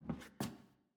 Footstep Hallway Rotate

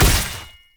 attack light; bloom minigame

